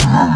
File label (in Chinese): 骆驼受伤声